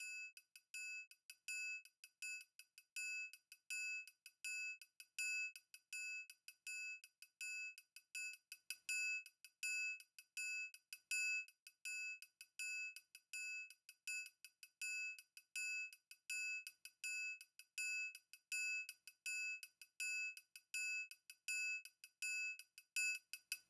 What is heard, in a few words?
drum track triangle, Smooth RnB, 081 Cmin